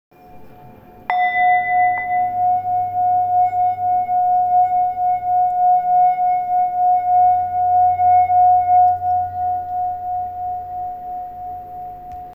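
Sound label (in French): bol martelé, Bol tibétain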